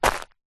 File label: foley footstep single stoney path; zapsplat